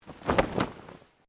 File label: Clothing shirt cotton flap; Movemement sounds for various articles of clothing; Dressing Sound Effects